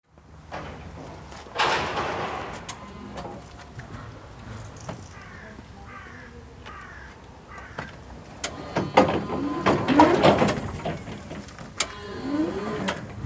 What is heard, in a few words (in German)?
Gabelstapler Ameise Fahrt